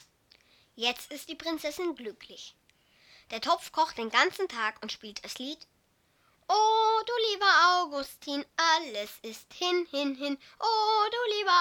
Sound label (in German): Schwein Ton